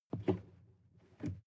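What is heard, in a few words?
sports car interior pedal brake medium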